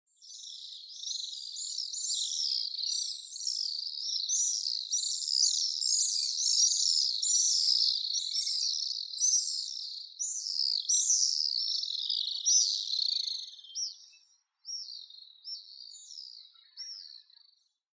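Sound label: Pacific Swift; amatsubame; koe; osado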